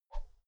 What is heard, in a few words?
whoosh thin slow soft; swooshes-swishes